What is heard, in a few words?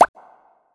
Bubble Pop